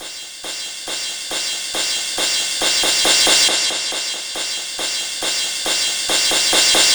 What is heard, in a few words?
Cymbal Loop, Dance Beats; Drums